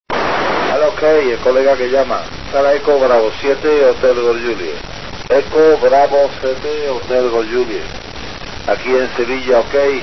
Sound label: 2m Es FM; EB7HGJ